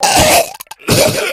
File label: death, infected